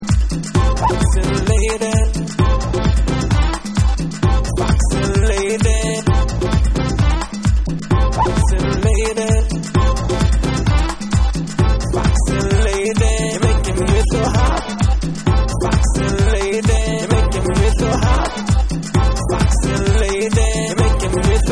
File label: Vocal Mix